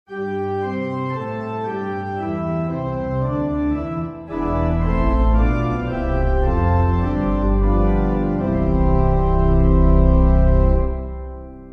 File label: organ